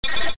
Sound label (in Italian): suoneria botton; beep